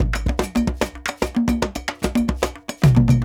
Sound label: Mai, full ensemble, world percussion; Sony CS - World Percussion